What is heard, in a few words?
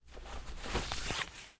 card take; Apotheorasis